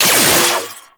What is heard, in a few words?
Trip Laser Impact; Trapper